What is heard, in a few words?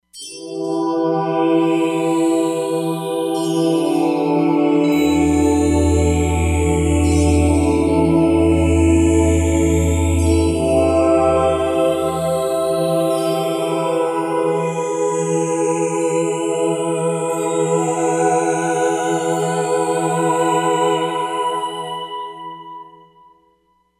Roland Fantom XR; Timeline